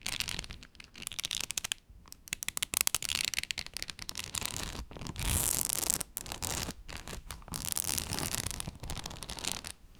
rubber stretching

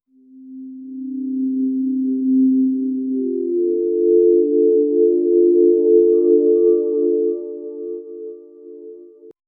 quiet suspense music and, low wind

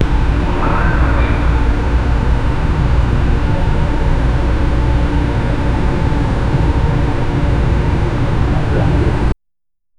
portal ambience

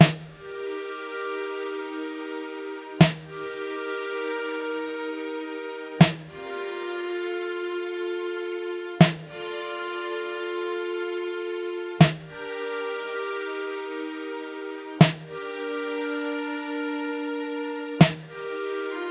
emotional military tribut, tribute

